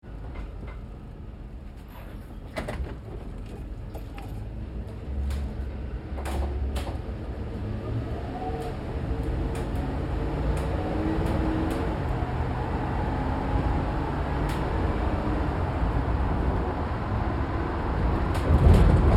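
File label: Truck GMC Savana Interior Drive Accel Decel Fast; Trucks; Big Room Sound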